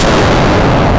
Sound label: the sound of an energy, energy sphere